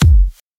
rich mid range steel kick drum F sharp key, bass drum sample